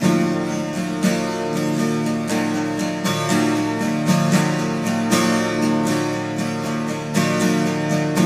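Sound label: 12 string minor E2, guitars; Spectrasonics; Hans Zimmer Guitars VOL-1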